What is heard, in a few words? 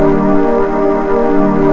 Mute chord; Instruments